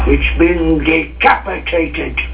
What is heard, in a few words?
Decapitated